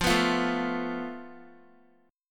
F Major Flat 5th, Guitar; FMb5 strummed; JGuitar

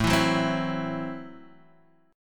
A Minor 6th A Guitar Standard; Am6 strummed; JGuitar

